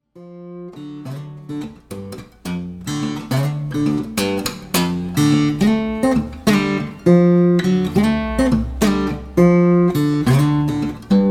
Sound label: Blues; Blues Book 1